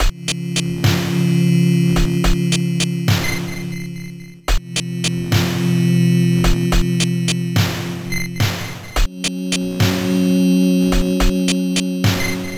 amiga in game; turrican iii

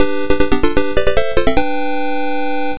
next pyramid, msx; kings valley; konami

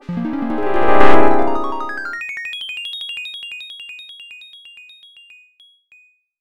arcade game over; marble madness

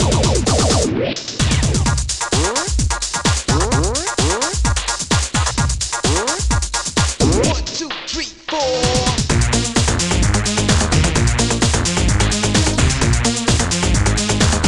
amiga techno

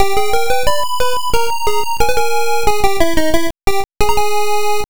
game over; msx; kings valley; konami

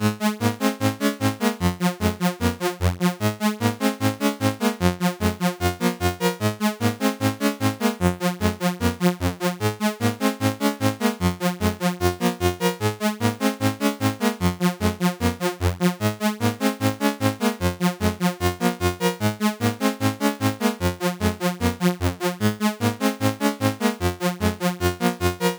c64 in game; lazy jones

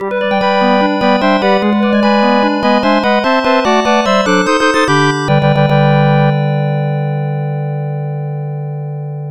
arcade below 2nd place entry end; ghosts n goblins

